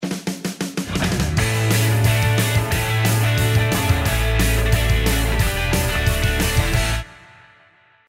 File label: ROCK VERY FAST